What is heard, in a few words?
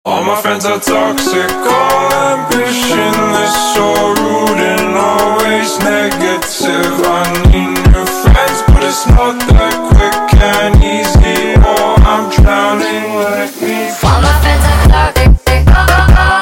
Funk sped Up